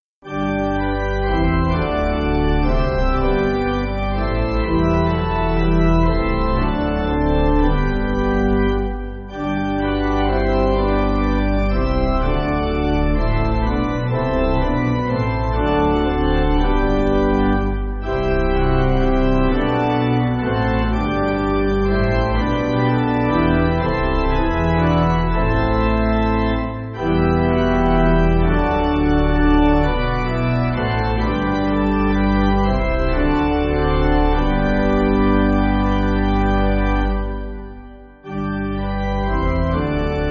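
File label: Pipe, Pipe Organ, Music Backing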